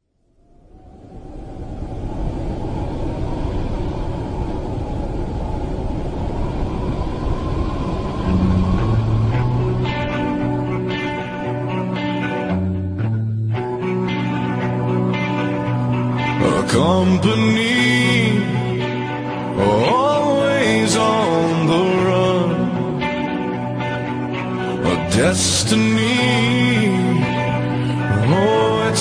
nokia lumia ringtone

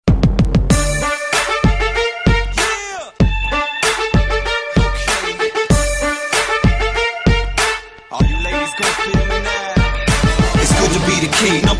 oppo f5 ringtone

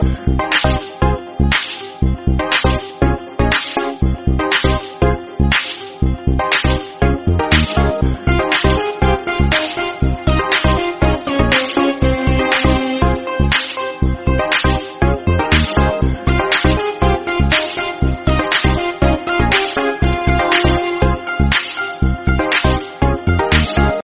electro house ringtone

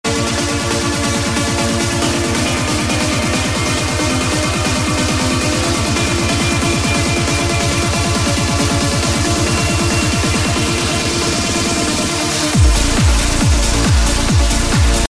beats electro ringtone

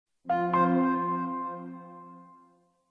iphone x sms tone ringtone

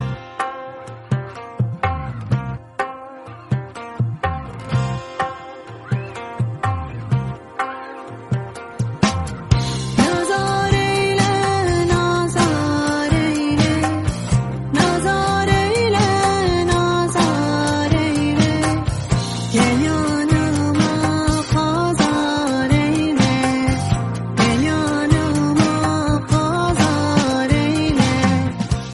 turkish ringtone

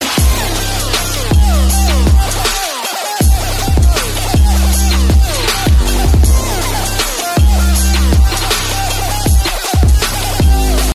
apple iphone beat ringtone

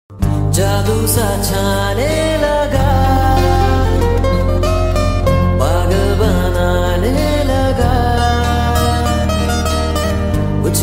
unplugged ringtone

